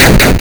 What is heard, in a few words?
combat; flixel; TurnBasedRPG